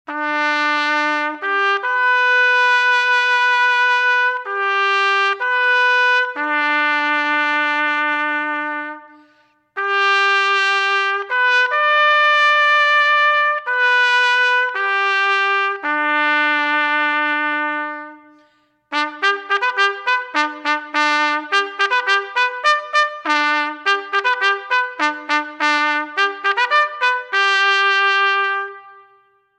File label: church call, Ceremonial Music; Marine Corps Birthday Ball